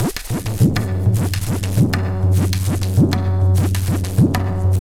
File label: Pure Tabla, 100BPM; Zero-G